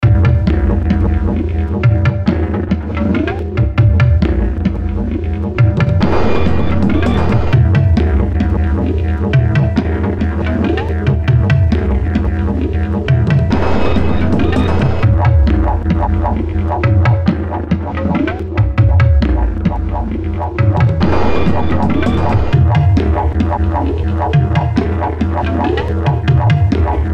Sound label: Acid Beats